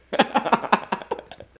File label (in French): Rire